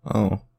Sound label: Sadchorus